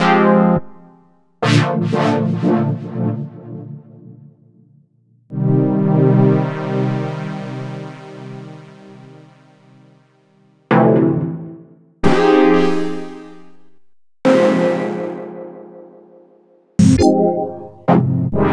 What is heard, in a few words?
Chords; Electronisounds